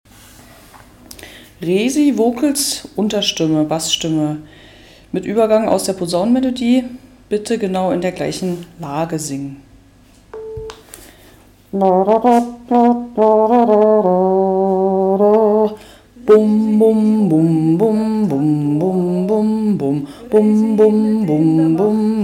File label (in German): voc Unterstimme